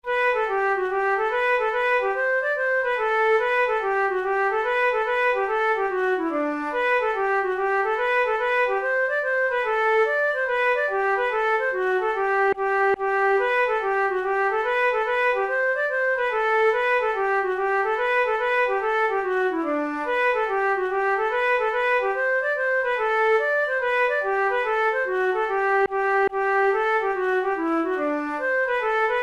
hornpipe, flute; Parisot's hornpipe

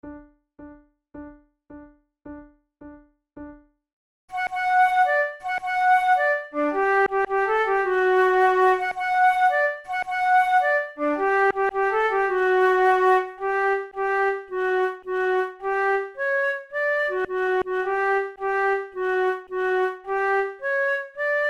duet, flute

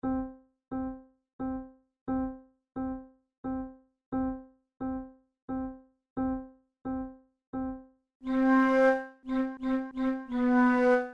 horn duos polonaise; mozart

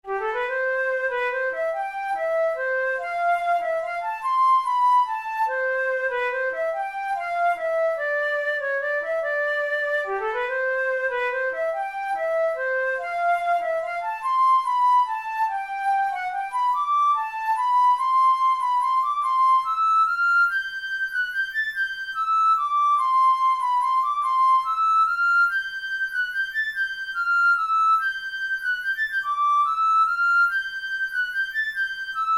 waltz, flute

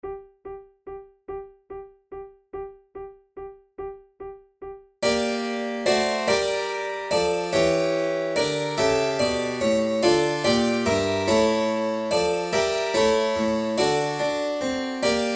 tempo di minuetto

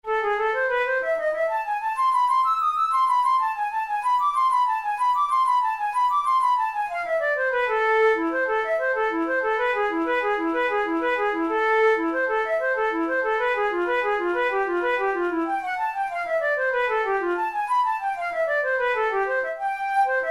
methode pour la flute study; drouet

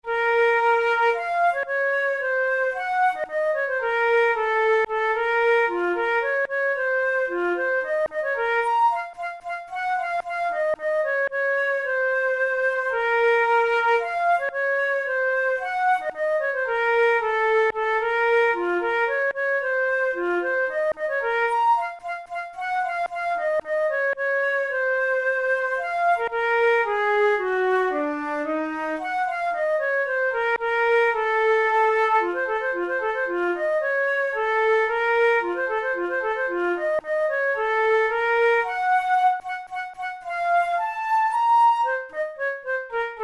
flute studies in b flat minor; dothel

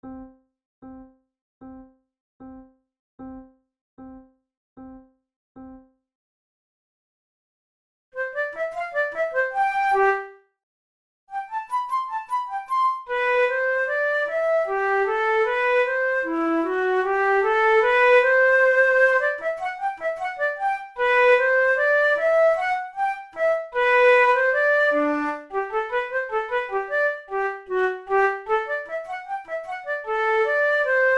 in c major, flute

